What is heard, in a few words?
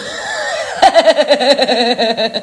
hehehehe